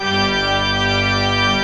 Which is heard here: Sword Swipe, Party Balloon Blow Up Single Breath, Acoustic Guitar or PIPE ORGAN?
PIPE ORGAN